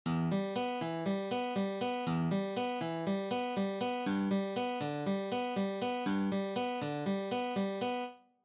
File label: fingerpicking